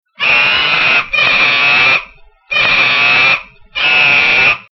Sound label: Monkey Distress; ORION